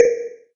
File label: tock; Drunken Robot Pornography; Inago Rage